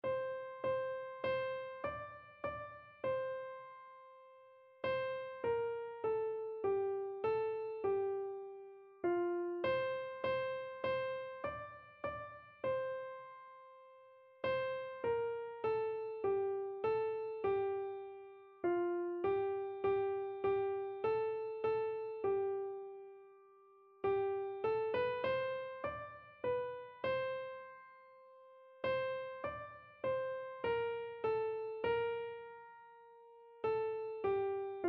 now thank we, keyboard

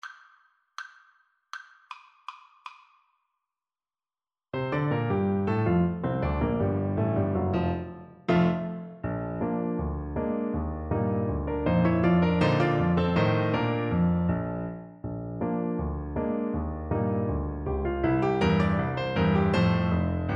horn, French Horn

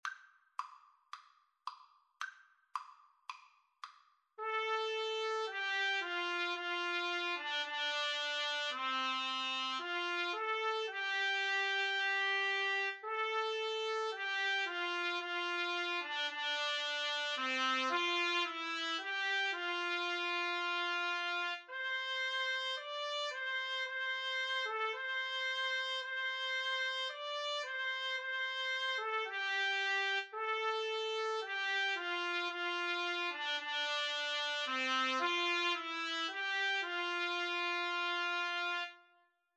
nearer my god brass 2 TPT

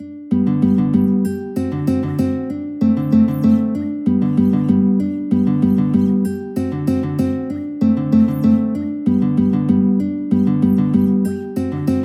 Andantino Mosso in G, guitar

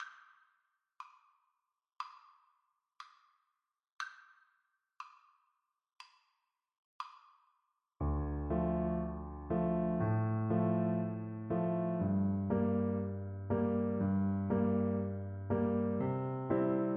Recorder

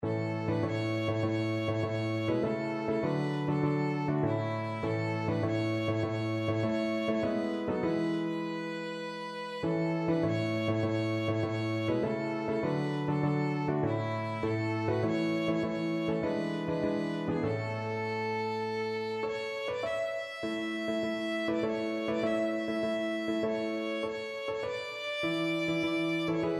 when the roll violin

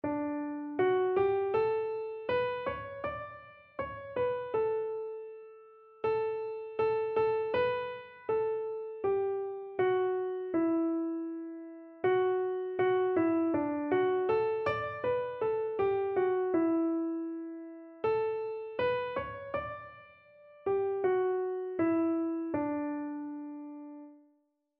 duke street, keyboard

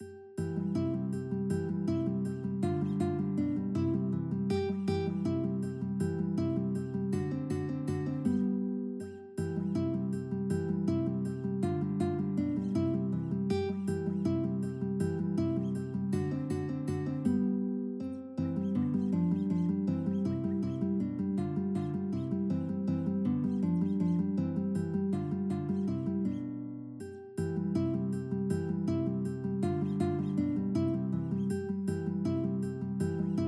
Waltz, GTR, guitar tab; Carcassi

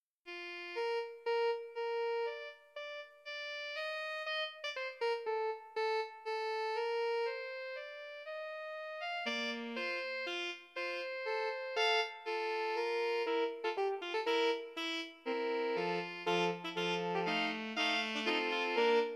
sax choir, alto sax choir

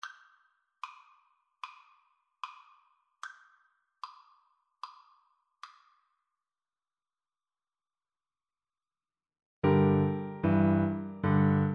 boogie, Trombone

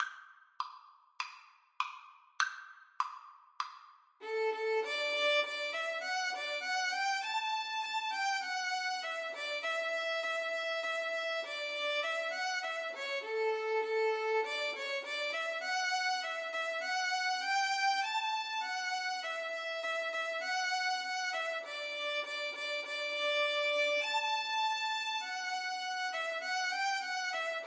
Violin Duet

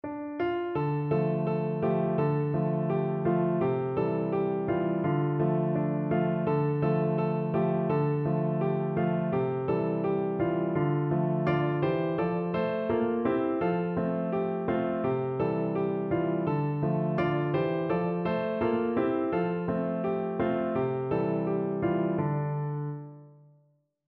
taino tee piano

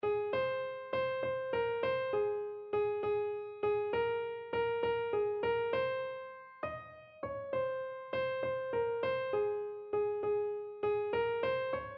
how i love jesus, keyboard

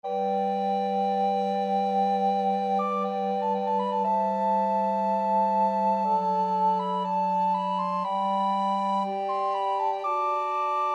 adagio and allegro in a major, recorder quartet

